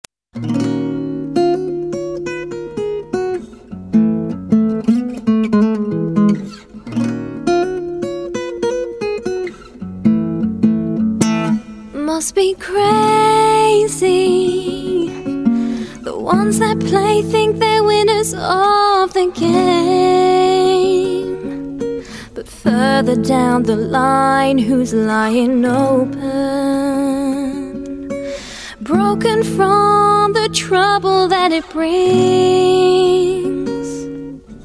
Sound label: Female Vox